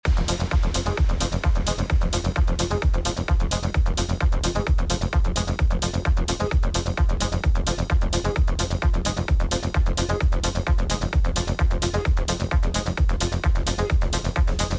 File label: chords example